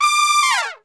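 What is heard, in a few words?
D 4 TRP SHF, Trumpet; Best Service; Brass Super Section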